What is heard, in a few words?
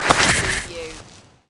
Skiing, Snowboarding, Jump takeoff or ski slide turn